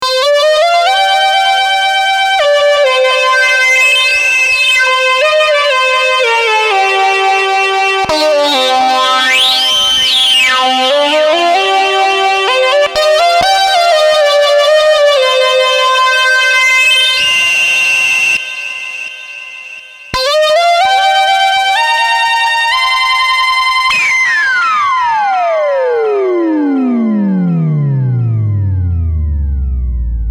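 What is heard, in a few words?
screaming, synthesizers, ms-20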